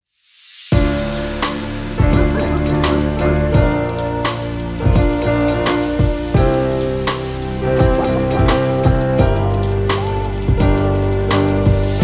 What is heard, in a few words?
Piano Hip Hop Intro Main; Stereo Nuts